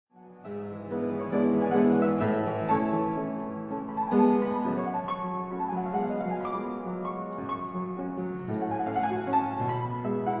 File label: Piano Sonata in D major Allegro moderato